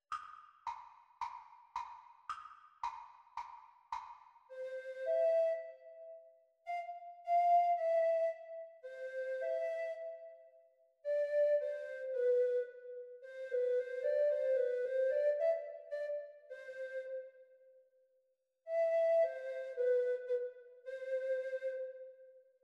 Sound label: Treble Recorder Performance speed; Grade 2